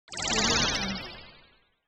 time spell; soundstormlabs

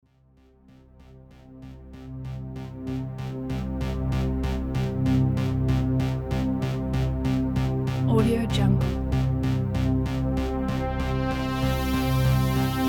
scifi electronic ambient

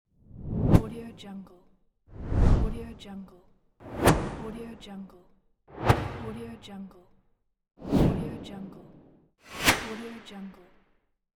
woosh

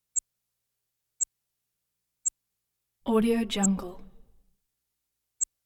synth beep soft zip

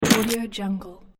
break; beforemusic

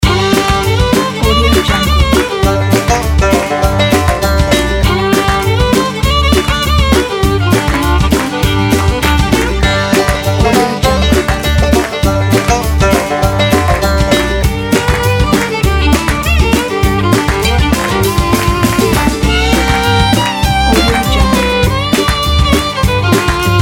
country

